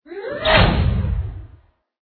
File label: black widow